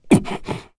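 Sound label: Vox Damage, Korean; Kain; kingsraid; voices heroes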